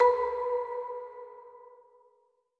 orbit marimba; gamerip; Sky Children of the Light gamerip

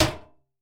lid metal lid close; A Dump in the Dark

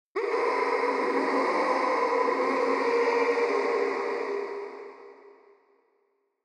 Ambiance; Fnaw1 New Ambiance 3

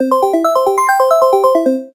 Slotmachine Middle Melody

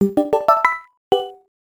Complete; brain age express arts and letters

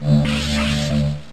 Rising Thunder; pokemon reborn e19 2022

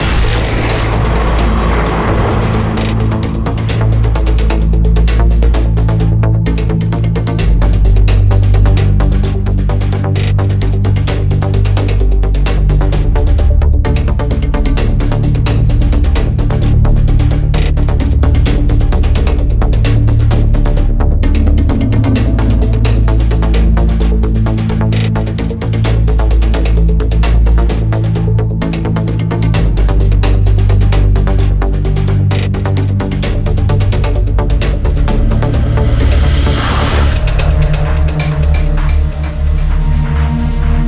Tense; Everspace 2

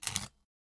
light on

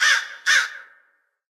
Dark Army Archer Crows Nest Attack

